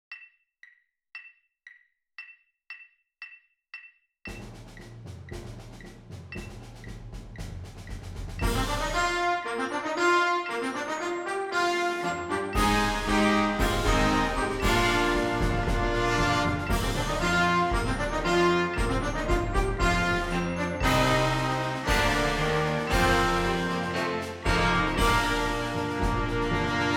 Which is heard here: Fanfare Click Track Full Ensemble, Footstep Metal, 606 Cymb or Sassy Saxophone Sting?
Fanfare Click Track Full Ensemble